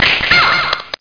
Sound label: SLAP